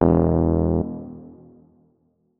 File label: notes, keyboard, electric piano